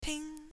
Ping; Assemblee SFX

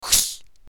crack; Assemblee SFX

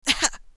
hit; Assemblee SFX

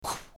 Woosh; Assemblee SFX

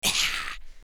hiss; Assemblee SFX

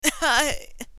Pain; Assemblee SFX

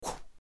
Woosh; Assemblee SFX

